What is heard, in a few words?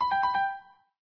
answer